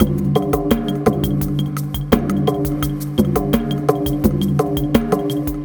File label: SOLAR FRAME GROOVE; Sonic Foundry (Sony Creative Software); Vir Unis Infusion